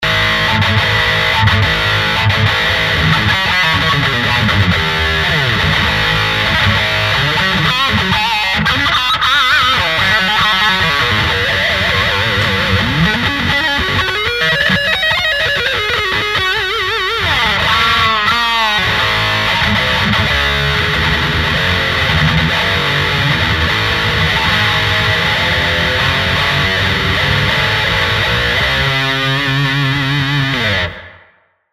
pulverize sg vox ac30, distorsion guitare; Ubermetal; line6; tonecore

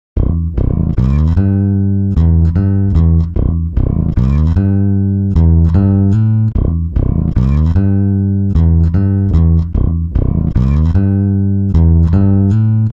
bass, timestretch; digidesign pro tools xform

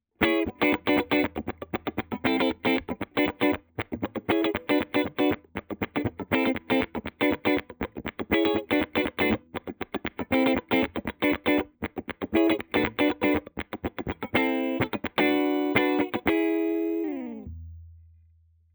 clean middle funk gibson; guitare; vintage electric

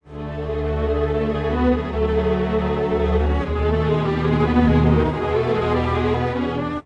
strings; timestretch; apple logic pro complex

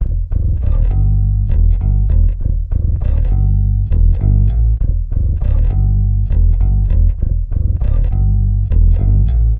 bass, pitchshift; apple logic pro monophonic

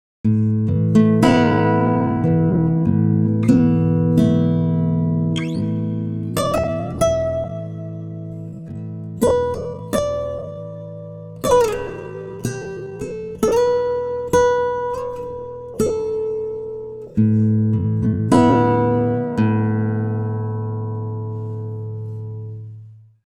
guitar, timestretch; ikm sampletank stretch

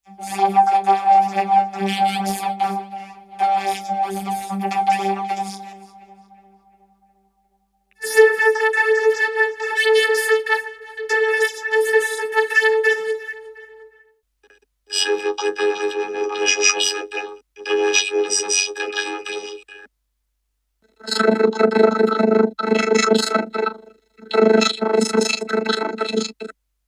vocodizer; plugiator

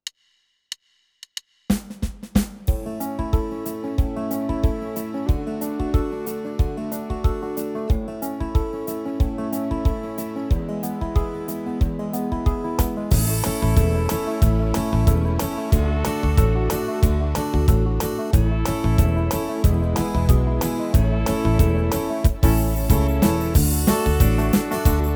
tyros3 style country 70s chart country, yamaha

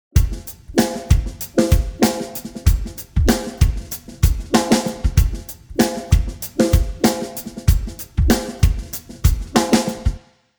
drums; prosoniq time factory mpex3; timestretch

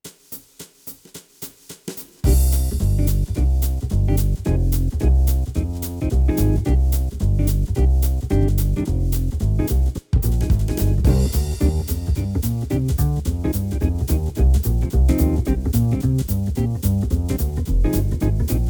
tyros3 style swing and jazz modern jazz, yamaha

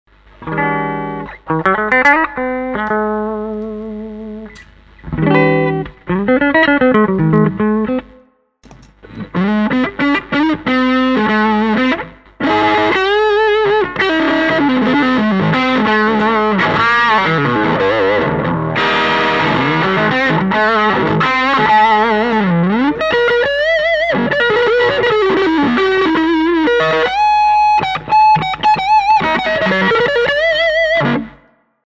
Crunchtone crunch sg vox ac30; line6; tonecore; Uber-Metal

